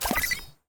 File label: next button appear; Ben 10 - Spot The Difference